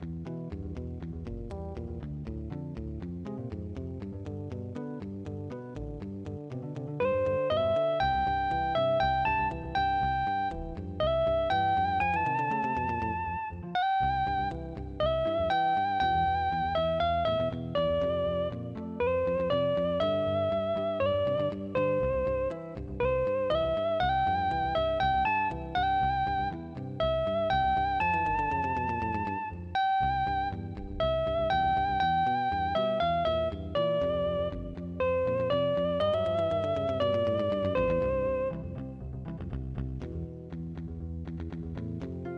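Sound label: michael hawaiian venture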